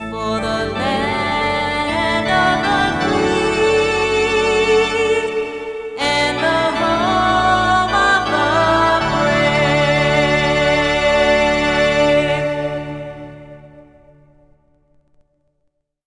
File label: anthus; nhl 96